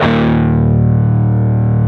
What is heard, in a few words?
PRS PWR E2F, Guitars; PRS PWR CUTS